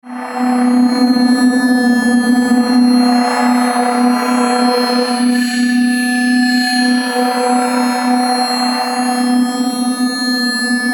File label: Bed Metallic Filter Feedback Glow; Bed Sound Effects